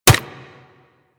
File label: Nunchaku Catch; Warframe; Tenno